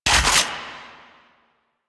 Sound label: Finisher Shotgun Pump; Symbilyst; Warframe; Quests; The New War; Kahl; Weapons